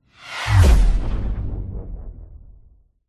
sound design cinematic whoosh short into a groaning sub bass tail